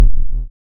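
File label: waveform FM triangle sine envelope silence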